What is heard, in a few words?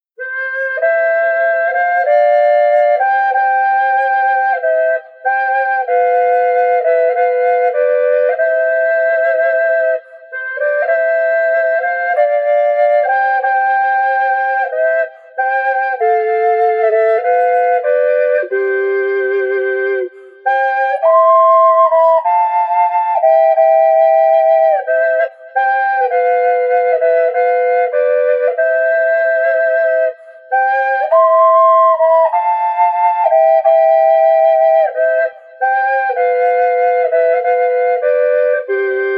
duet, ocarina